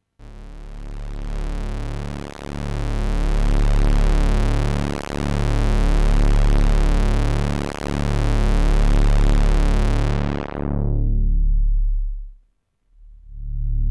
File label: overdrive 2 squares, p600